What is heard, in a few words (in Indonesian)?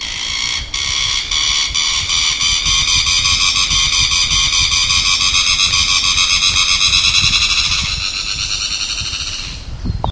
takur api